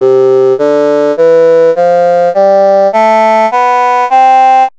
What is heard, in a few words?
bassoon